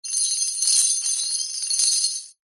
industrial chain small movements drag lay down concrete